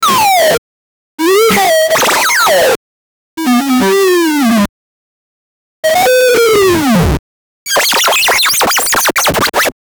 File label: short echo, smoke